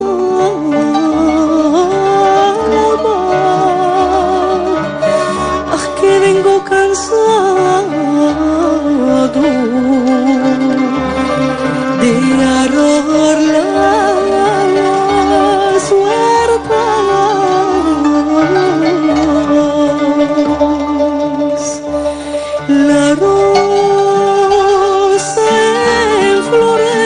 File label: gitano flamenco ringtone